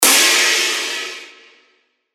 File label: Crash, cymbals; revealed cymbals vol 1